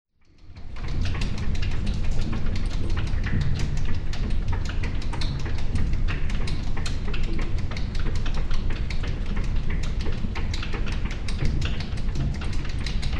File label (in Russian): SHesterni bolshie